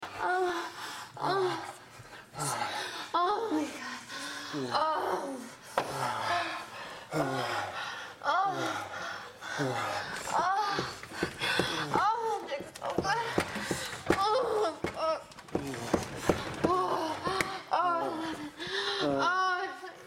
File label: moan